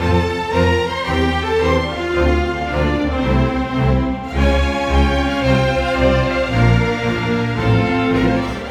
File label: Rock Pop Strings, Orchestral; Sonic Foundry (Sony Creative Software); Orchestral Series VOL-4 - Rock and Pop